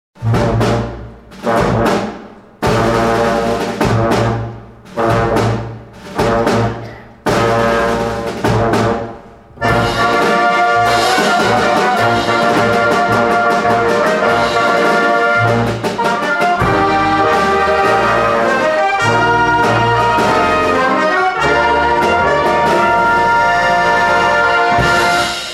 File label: Fanfare; 20th Century Fox